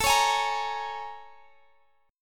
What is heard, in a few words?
Bb Minor 7th A; Bbm7/A strummed